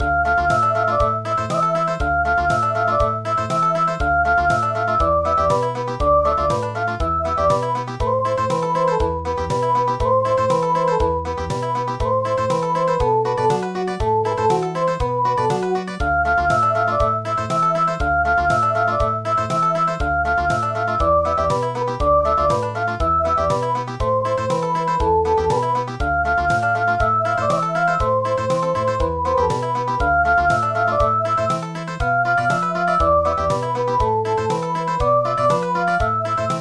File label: March Country